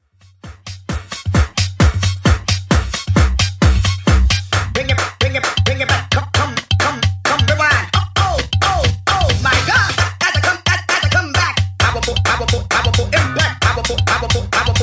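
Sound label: 90s Extend, 132bpm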